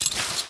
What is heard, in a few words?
switchblade draw; weapons; sven; cryoffear